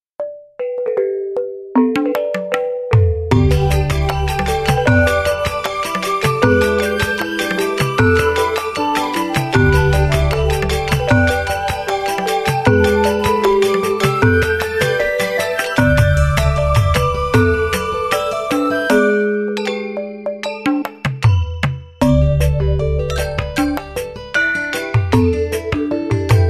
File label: degung angklung